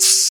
shaker percussion G key, Loudest frequency: 6738Hz